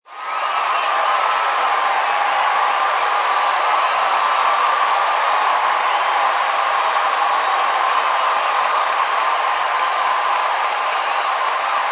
Sports Stadium Crowd Cheer Touchdown Or Goal Close Perspective; Crowd Sound Effects